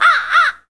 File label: crows; The Sum of All Fears